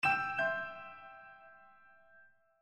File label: Piano; stingers; reflective